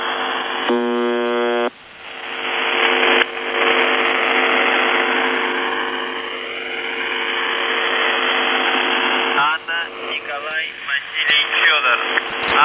buzzer usb msg

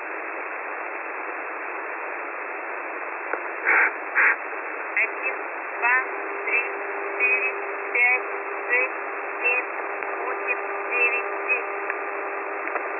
usb msg; goose 4310 usb

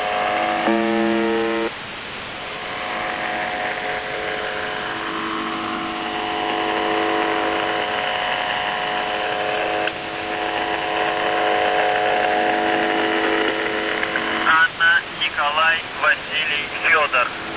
buzzer usb msg

